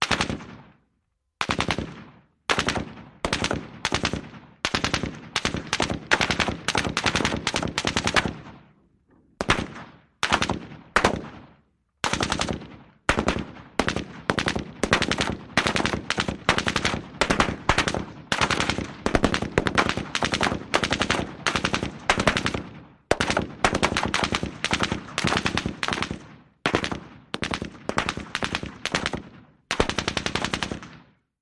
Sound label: M-60: Sporadic Firing, Bullets Impact Target. Stereo